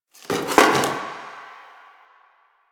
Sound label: Box Of Trash Rustle